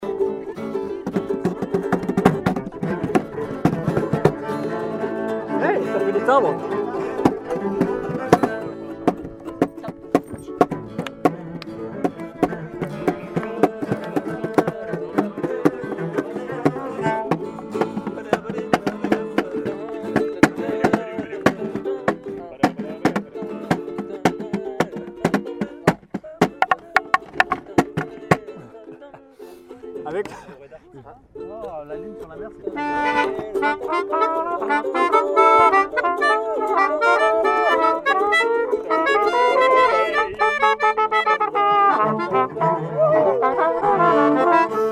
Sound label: Soft jazz